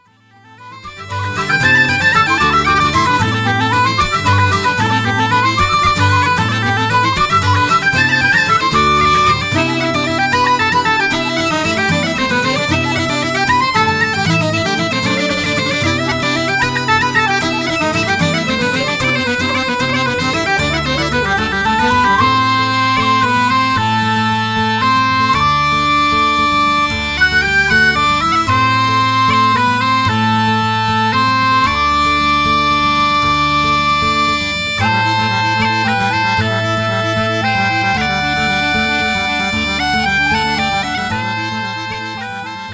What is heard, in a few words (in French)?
Bourrée, Bourrée; Bourrée de l'Exutoire; Bourrée de la Saint Matthieu